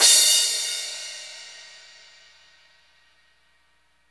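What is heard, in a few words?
cymbal crash, Roland; Club-50 - Foundations Roland; Ext.Snare 4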